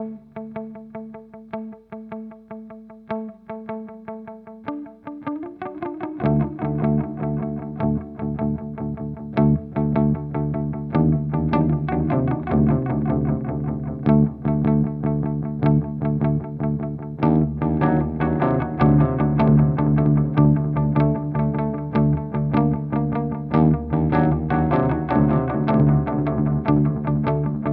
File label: Timeline Dual; Strymon